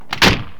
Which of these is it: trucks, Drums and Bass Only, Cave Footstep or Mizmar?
trucks